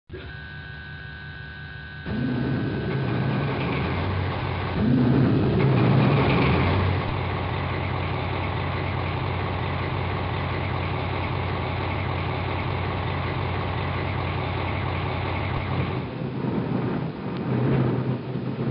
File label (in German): diesel std